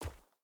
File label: wolf footstep dirt hard; Rust